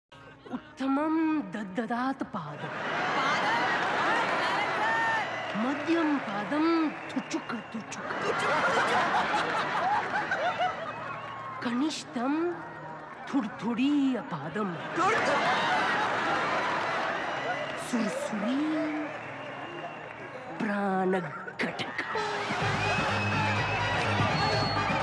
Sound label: Chatur Padam Shloka